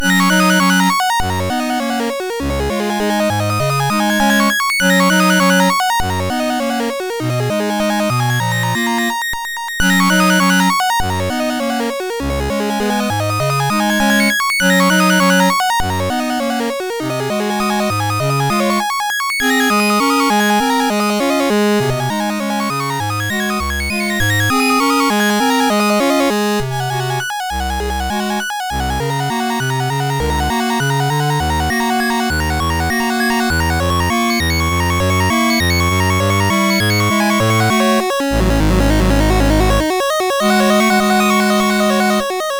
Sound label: Etude in G Flat Op10 No5 MOS6581R2